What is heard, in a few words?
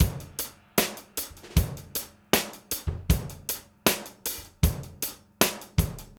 DOWN KIT 78; AMG Gota Yashiki Groove Activator